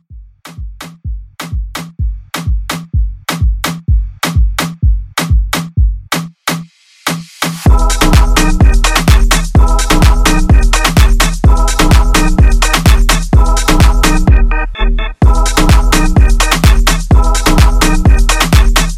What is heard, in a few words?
Afrobeat Re-Drum 127